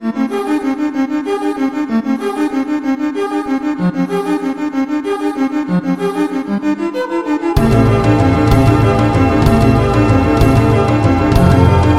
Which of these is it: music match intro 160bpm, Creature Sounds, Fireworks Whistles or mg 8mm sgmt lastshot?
music match intro 160bpm